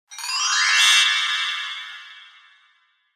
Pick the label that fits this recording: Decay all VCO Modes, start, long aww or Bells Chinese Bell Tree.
Bells Chinese Bell Tree